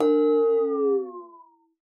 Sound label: WATER, PERC; Xsample VOL-9